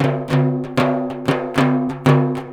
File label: timbal, Indian Traditions; USB Soundscan vol.45; AKAI